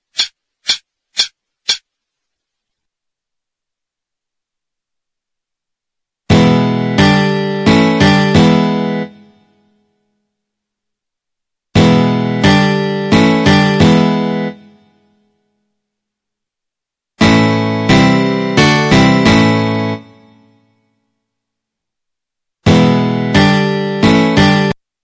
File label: intro rhythm, guitar